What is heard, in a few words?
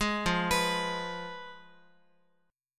F Diminished Guitar; Fdim arpeggiated; JGuitar